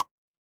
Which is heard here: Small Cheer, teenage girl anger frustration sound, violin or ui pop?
ui pop